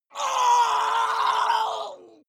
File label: water monster female gargle deep pain; koerpergeraeusche; comedy-cartoon; soundpool